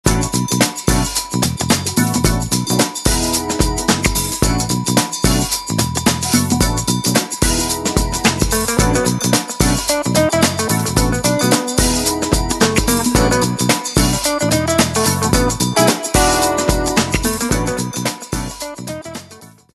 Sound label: Motion; Motorola KRZR K1m